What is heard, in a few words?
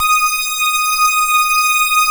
P5 STRINGS; Greytsounds; Scott Peers Sound Engineering